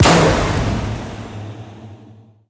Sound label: double jump, car